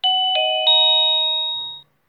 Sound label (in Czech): melodie